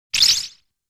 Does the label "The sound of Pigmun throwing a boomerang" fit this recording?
Yes